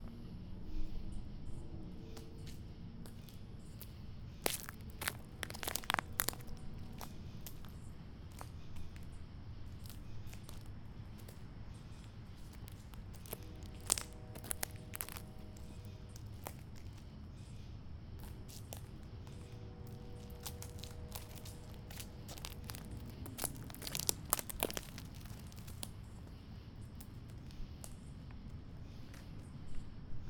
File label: Nuts And Twigs